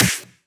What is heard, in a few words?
Snares & Claps; Vengeance Samples